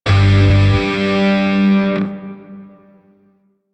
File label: Shawzin Min Chord J; Grineer; DE; Warframe